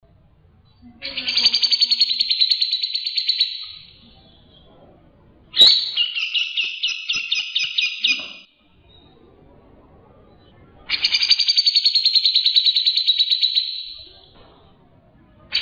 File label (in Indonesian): suara cucak jenggot isian